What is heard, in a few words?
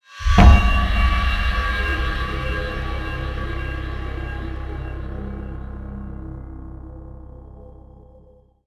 Die; Tom Clancy's Rainbow Six Lockdown; GC; PS2; Xbox